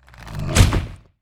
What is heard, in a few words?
tree clobber